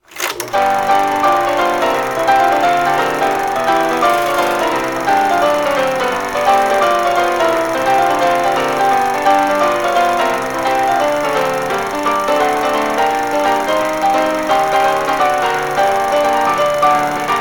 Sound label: Piano Rag; rayman arena; Cheat